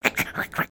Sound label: Trex Running Growls; creatures organic